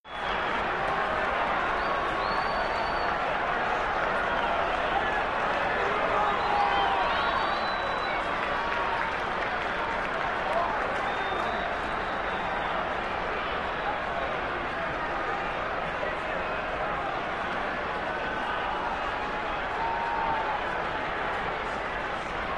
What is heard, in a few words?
Arena Crowd; Crowd, Active Claps To Cheers.